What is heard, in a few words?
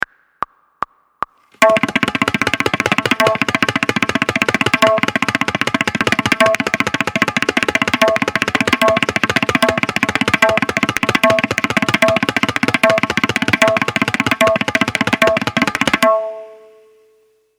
Long Roll Practice